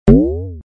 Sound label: Bubble Bounce